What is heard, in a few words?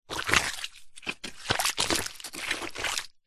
wood stick movement sludge wet mud squelch; foley